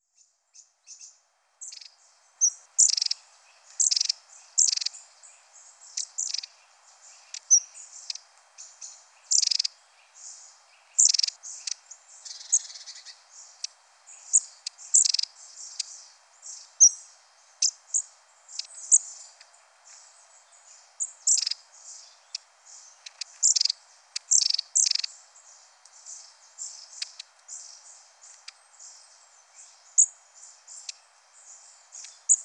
Mésange à longue queue

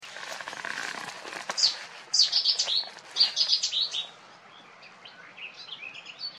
Bouscarle de Cetti

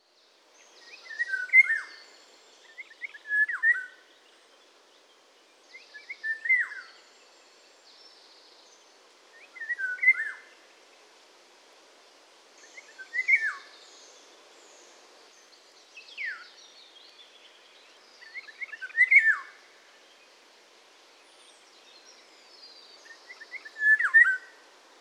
Loriot d'Europe

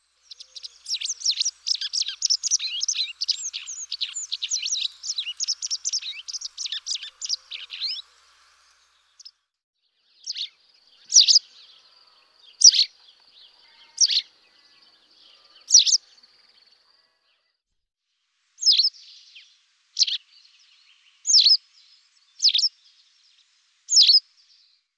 Bergeronnette grise

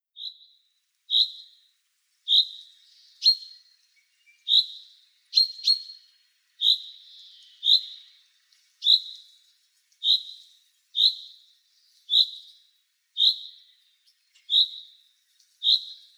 Pinson des arbres Cri d'appel

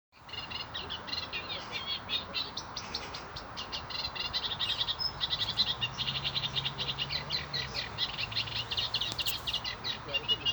Rousserolle effarvatte

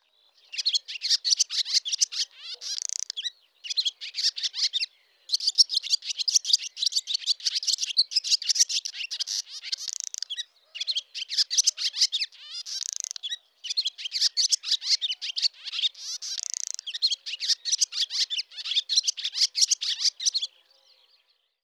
Hirondelle rustique chant

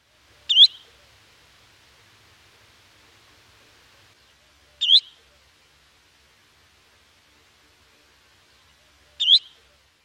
Scolopacidés Tringa erythropus Chevalier arlequin

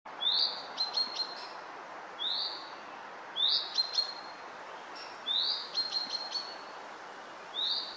Pinson des arbres

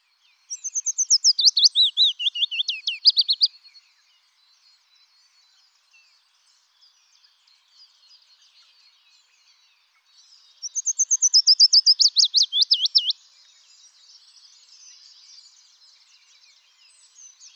Pouillot fitis

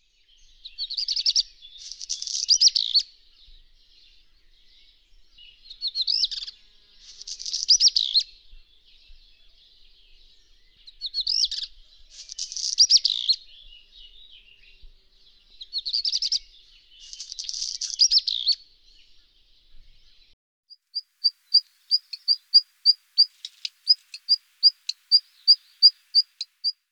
Rougequeue noir

